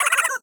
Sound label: Cry; Loali